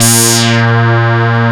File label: OSCAR A#2, synthesizer; Hollywood Edge - Giorgio Moroder Rare Synthesizer Collection